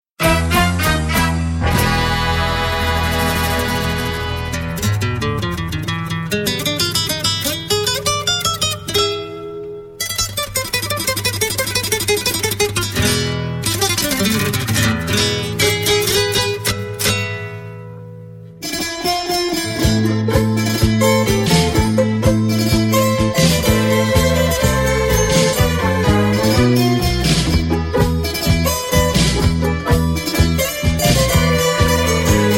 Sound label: guitar tango